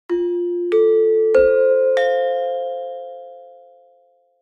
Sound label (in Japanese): ピンポンパンポン